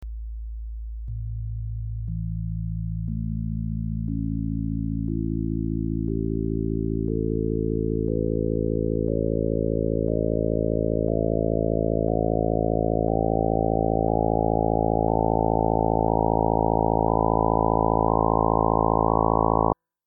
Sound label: harmonics; harmonie